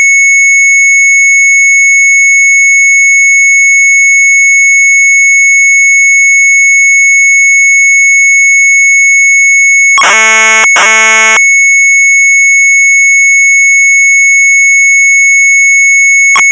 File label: MZ800 Tape, sharp; mz800 wav; ALLERDAAGS